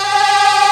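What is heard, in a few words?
MINOR TRILL G1, Strings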